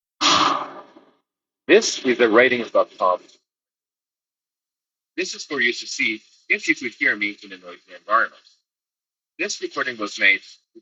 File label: noise